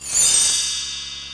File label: bell; CelticOrange